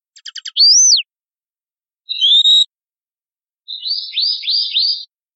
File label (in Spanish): silbidos, pardillo